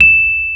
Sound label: E 0, Electric Pianos; Wizoo